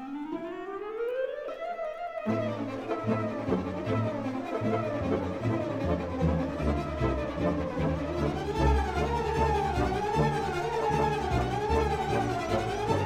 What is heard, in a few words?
bee; AnimalAntics